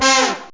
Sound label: horn fall, synths; Zoom - Release 2; Active Software